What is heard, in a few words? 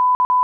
beep